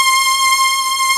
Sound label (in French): VIOLONS, PPG; USB Soundscan vol.49; Analog Fantasies; AKAI